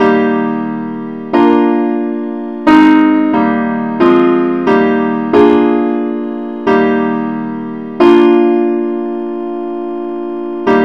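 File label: simple Acc key E flat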